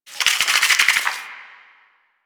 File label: Cave Insect; Warframe; Quests; TheNewWar; Ambience; Drifter Camp; Insects